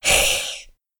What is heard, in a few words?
naga hiss